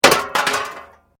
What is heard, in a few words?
Metal Light Movement Minor